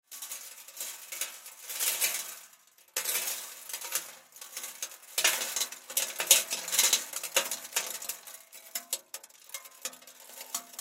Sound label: Impact Debris Drop Metal Surface; Sound Effects:Materials; Blastwave